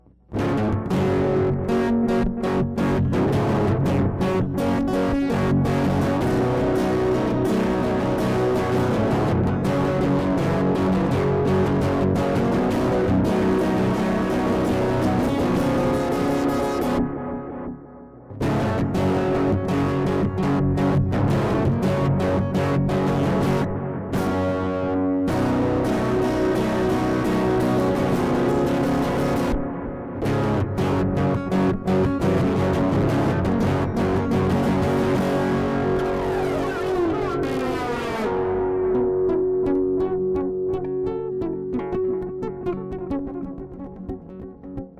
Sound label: electric acoustic